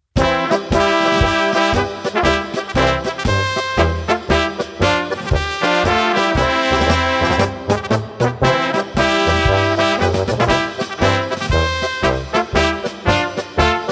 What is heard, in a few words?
polka